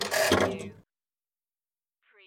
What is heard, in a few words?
Pinball sound, crane clank move and drop ball